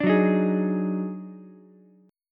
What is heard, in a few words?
Eb Minor Major 11th, Ukulele, C6; EbmM11; strummed; JGuitar